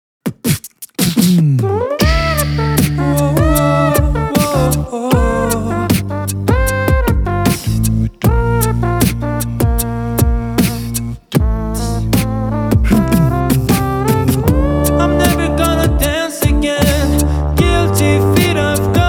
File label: Beatbox